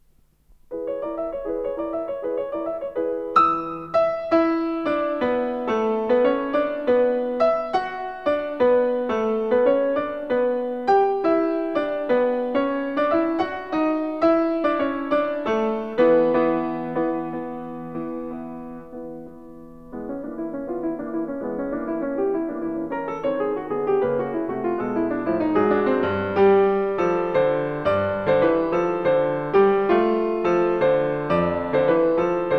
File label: Mikrokosmos Dance in Bulgarian Rhythm III, Piano; Bartók; Sz. 107 Book 6 No.148